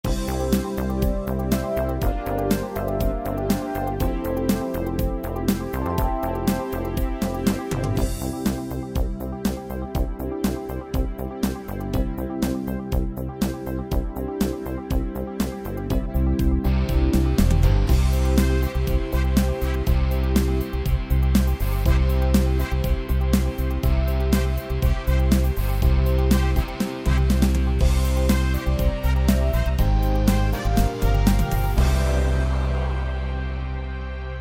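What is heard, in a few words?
SMOOTDNC C major, Pops; gw-7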